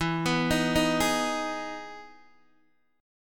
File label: E Major 7th E down Guitar DADGAD